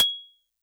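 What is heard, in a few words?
Xylo Snare DX100 G sharp 7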